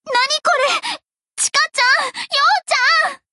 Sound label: power down